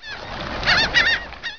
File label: waterbirds